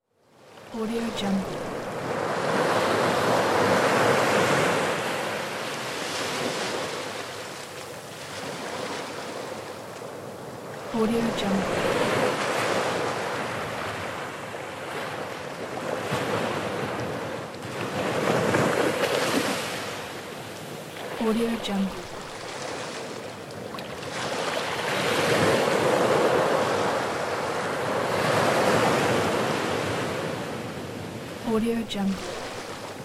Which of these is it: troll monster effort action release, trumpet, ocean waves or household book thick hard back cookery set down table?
ocean waves